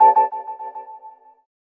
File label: iPhone 13 Mini WhatsApp